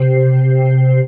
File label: DM ORG1, Dance Mega Synth